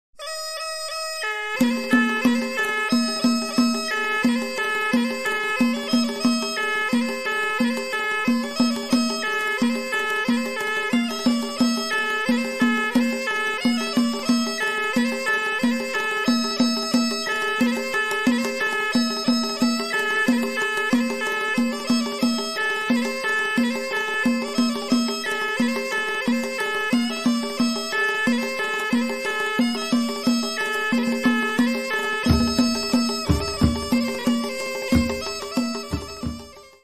Gherneh

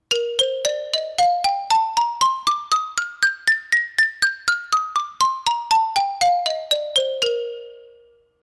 Xylophone

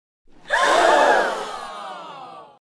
be shocked

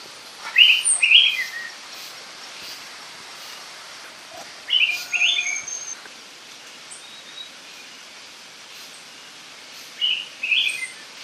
araripe manakin

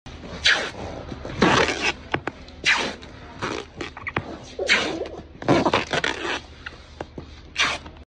packing

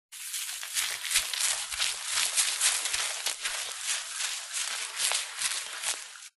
suds; کف صابون

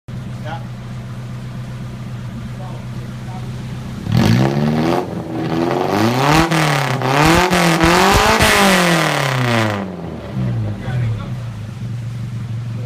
suzuki carry

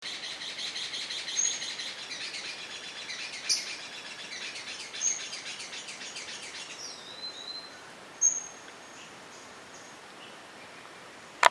Magpie tanager

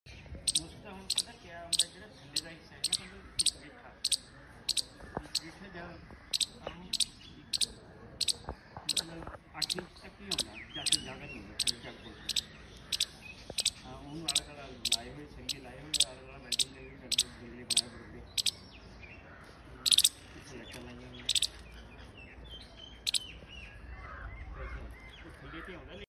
Siberian chipmunk; سنجابچه سیبری

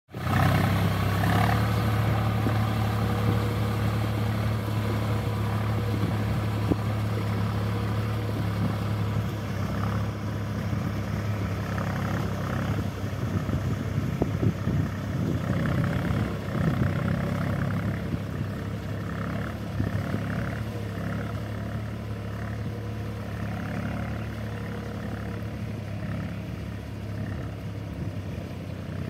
plowing soil

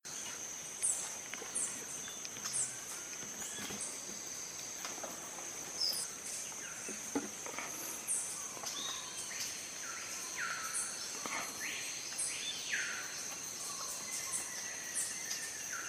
Blue-Dacnis, پرنده توتخوار آبی; صدای پرنده توتخوار آبی

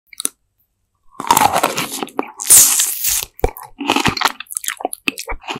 Eating lemon

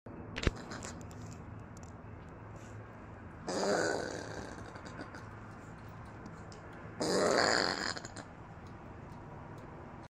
opossum; صاریغ

